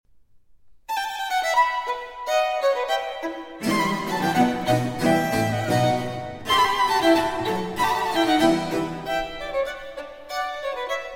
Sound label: Sonata For Violin & Continuo in E Minor, Allegro Assai